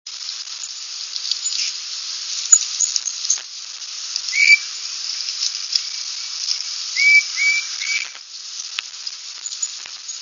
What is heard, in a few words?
titmice early spring pair, tufted titmouse; birdsongs